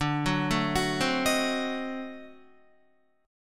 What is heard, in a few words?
D 7th Flat 9th D down Guitar; D7b9 arpeggiated